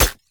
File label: vs flesh; LoL; sion